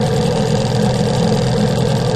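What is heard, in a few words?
9.9 Evinrude Idl Loop, boat; 9.9 hp Evinrude; 9.9 Evinrude Boat Idle Loop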